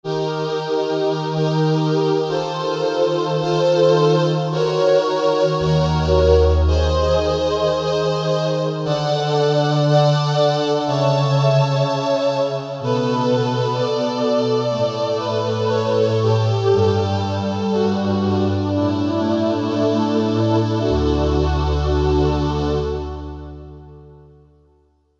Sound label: 2 voices; Korg MSC-12S Synth 3